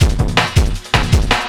LOOP L, Drum & Bass 160 BPM

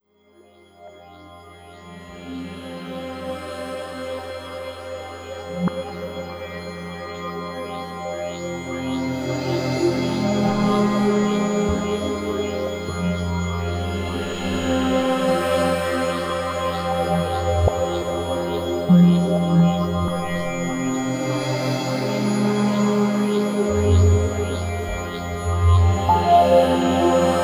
Liquid Bed; Beds; Sonic Foundry (Sony Creative Software) - Slow Silhouette

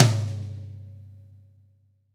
V-Pop Tom 43, Roland BK-5 Toms

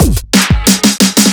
Speed loop fill, Drums Beats

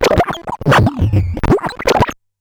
Bent Talking Teacher, Circuit Bent

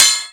Tent Post; Proteus 2000; Digital Sound Factory Proteus 2000

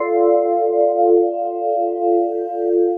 Pad Clang, Drum & Bass Pads; Sonic Foundry (Sony Creative Software); Knutrix Drum & Bass

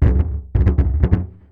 Marbles & Framedrum; contact mic; musicradar; contact mic madness; Raw

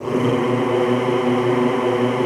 B1-R, Extended Classical Choir; Best Service

